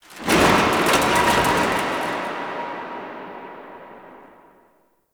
Stadium Metal Crash; Warframe; Ambience; Nineteen Ninety Nine

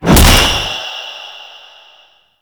Queens Chamber Shield Blast; Ambience; Gameplay; Warframe; The War Within

